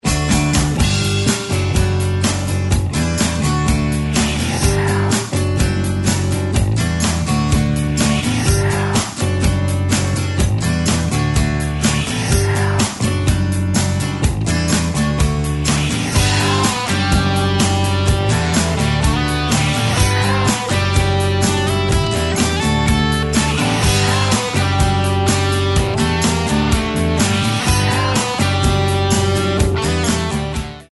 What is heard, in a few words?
Ocean View - 125BPM, rock